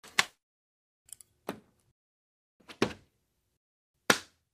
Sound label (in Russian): sharikovuju ruchku kladut